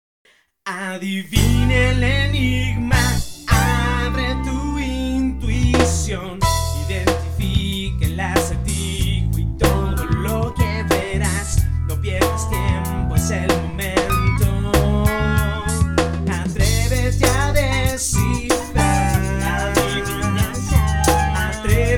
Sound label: jingle; adigma